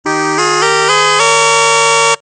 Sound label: Launeddas